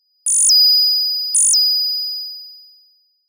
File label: ringing sound; standard ringtones